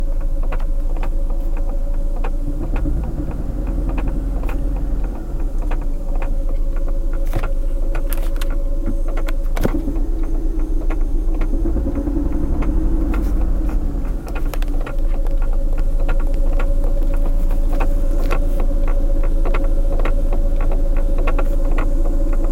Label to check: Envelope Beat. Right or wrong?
Wrong